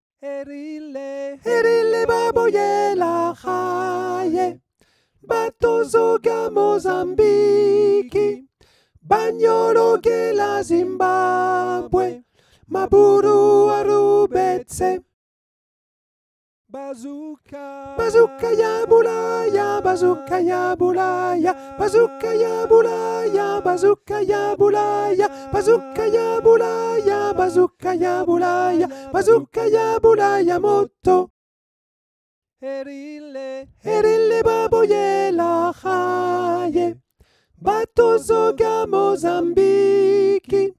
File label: Soprano